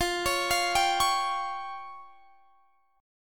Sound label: F Suspended 2nd Sharp 5th, chord; Fsus2#5; arpeggiated; x,20,23,22,20,21